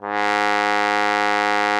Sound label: G#2, TROMBONE; Xsample VOL-3